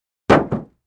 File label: Car Roof Impact